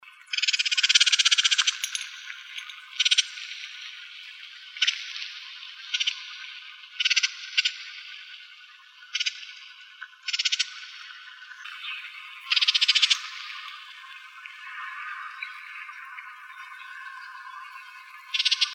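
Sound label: Streak-backed Oriole calls, Icterids; STOR; deviche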